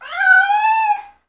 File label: scrm; The Elder Scrolls III - Morrowind